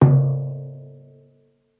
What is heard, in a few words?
tom mallets